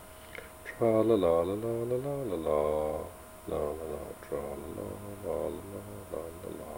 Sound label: tralala